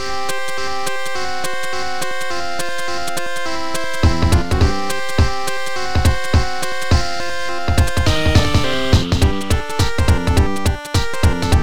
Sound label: Internal Percussion